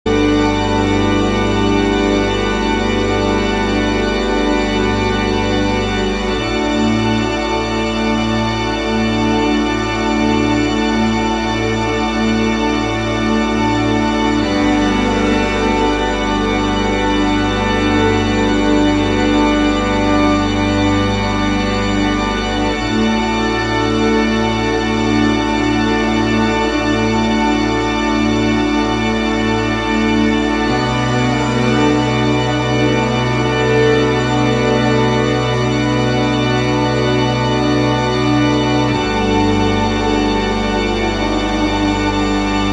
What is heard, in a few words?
tone